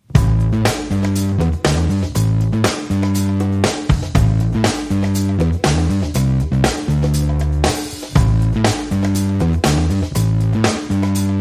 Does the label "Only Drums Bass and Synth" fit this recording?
Yes